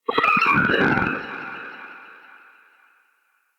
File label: Shrimp Vocal Death, Creatures; Vocals; Baliq Shrimp Glade